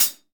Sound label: clear pedal hi-hat e key